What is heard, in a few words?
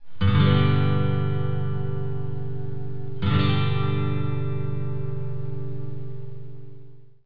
g chord, guitar